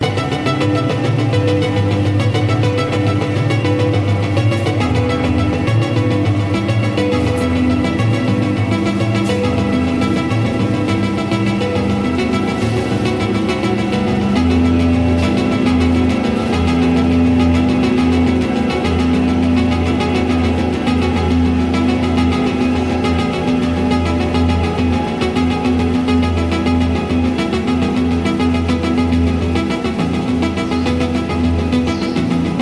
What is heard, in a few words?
Electronic Recordings From Maui Jungle